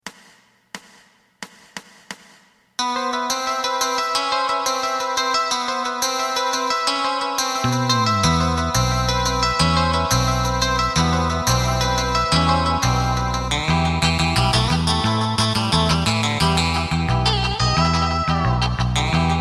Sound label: drum